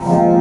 F STAB, funky

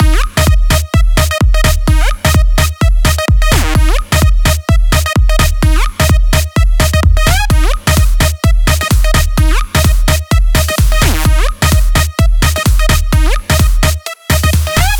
Dirty Dutch Mix; Mutekki Media; Vengeance Electroshock Vol.2; Dirty Dutch Kit 1

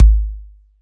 Kick

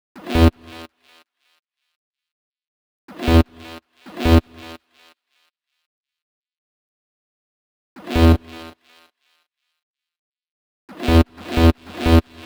123 Em Bass, Complex Electro Synths; Prime Loops; Onyx

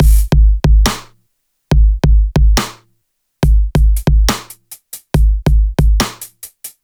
Bpm140 Break, Dubstep, Drum Loops; Leak

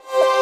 C STAB; Loopmasters; Funky Underground Vol 2

